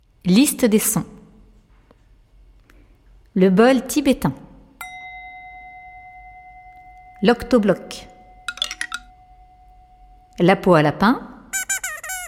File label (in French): Presentation des instruments